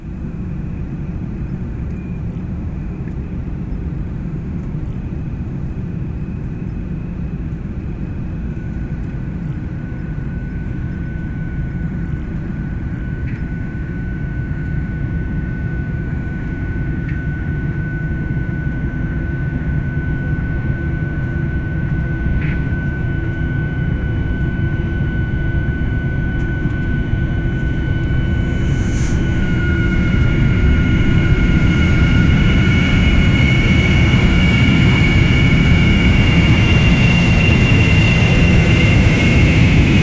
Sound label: ethiopian airline cargo, aviation